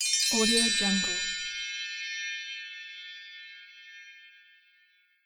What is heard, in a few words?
bell tree